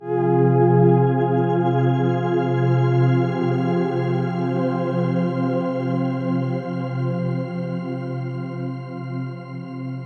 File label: TEXTURE Crystals - 161 BPM F Min, Ear Candy; Cymatics; ANALOG EVOLUTION Beta